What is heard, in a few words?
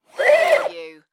Shower Laundry Line; sound effects bible